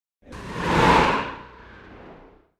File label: Whoosh By Growl Breath Fast; Hollywood Edge; sound designer's toolkit v2